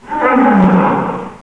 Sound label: roar